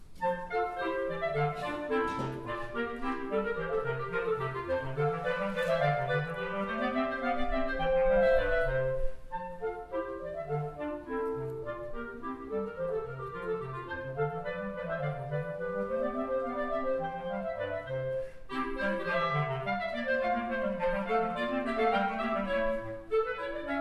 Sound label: Gavotte; French Suite No. 5